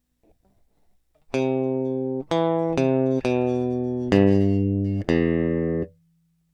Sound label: Elect Guitar